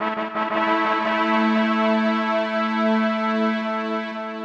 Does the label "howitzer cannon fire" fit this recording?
No